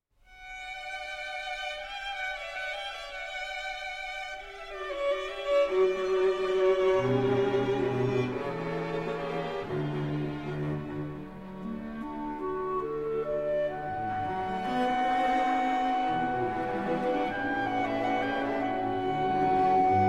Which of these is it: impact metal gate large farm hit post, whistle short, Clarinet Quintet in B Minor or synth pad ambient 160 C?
Clarinet Quintet in B Minor